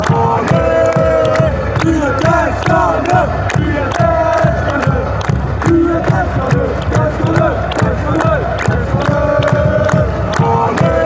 ole denmark, chants

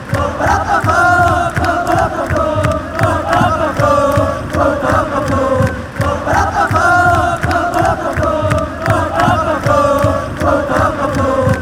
bo bo bo bo boo, fan chants

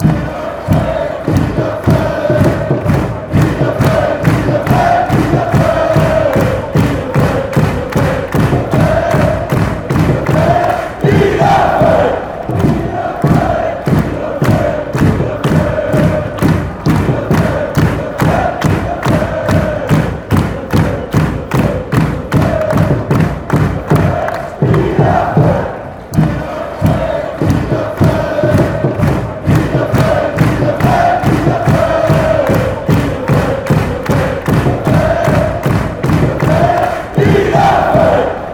bielefeld, fan chants

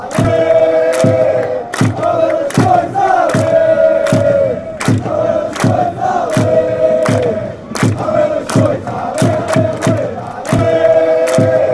allez allez leixoes allez, chants

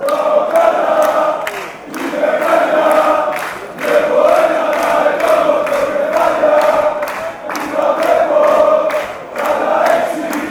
chant, fanchants; paok fc

